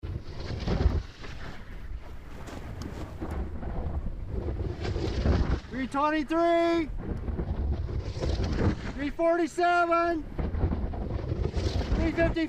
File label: bad flutter